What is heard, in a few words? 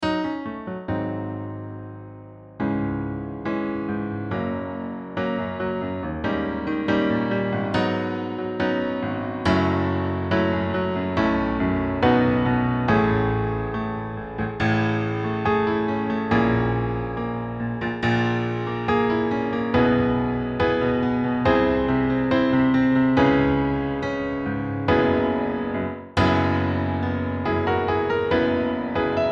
karaoke